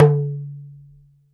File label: Talking Drum tone; Drums&Percussion; E-MU Producer Series Vol. 7 – Old World Instruments